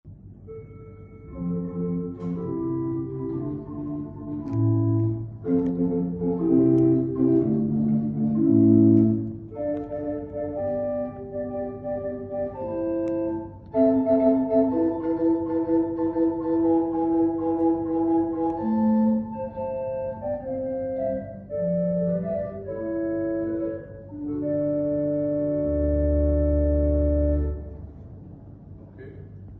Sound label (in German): Orgel, pfeifenorgeln; Benson